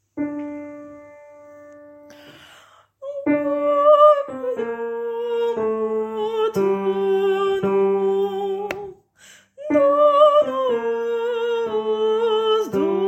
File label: Tenor; Graduale